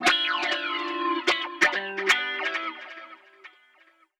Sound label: G min7, Guitars; Total Drum&Bass; 90 s Sample CDs